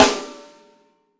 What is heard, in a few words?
Acoustic kit; Akai MPC2500; Drum-Percussion